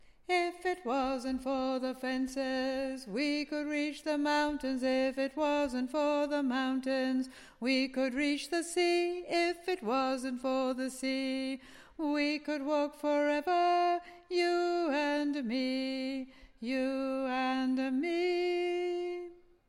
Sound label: Ten Bass